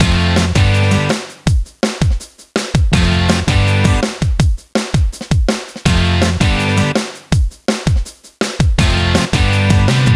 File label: Poprock Boucle; Imagine Modern Dancer; Nintendo DS